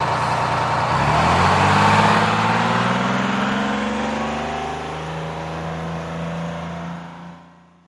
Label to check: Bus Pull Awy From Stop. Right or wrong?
Right